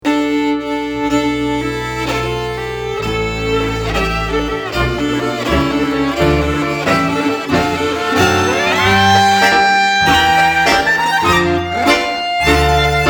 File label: Deux Gitarres